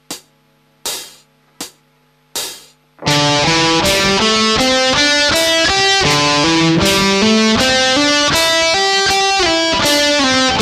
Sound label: leg